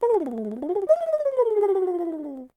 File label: turkey long howl